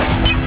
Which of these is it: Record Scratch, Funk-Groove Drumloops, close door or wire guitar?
close door